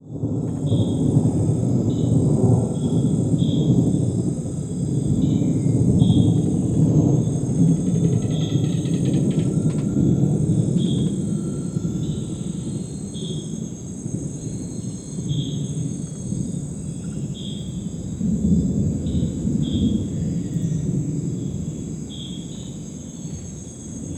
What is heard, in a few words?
Thunderstorm howlers stereo